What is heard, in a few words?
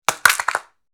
Plastic pill bottle falling on floor; medicine